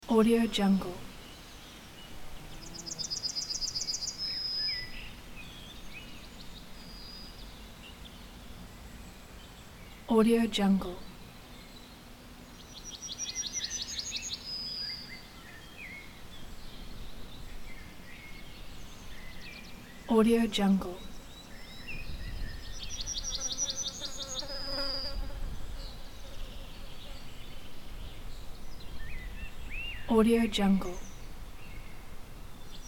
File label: forest clearing summer ambience loop; nature sounds; پرندگان در جنگل استوایی